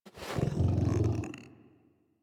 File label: Tame Interact Stand Scratch Affection Groan; Enemies; Warframe